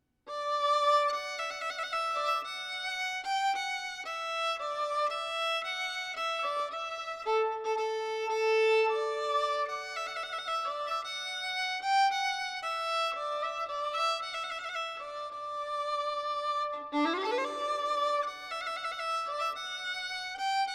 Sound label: trumpet Voluntary Solo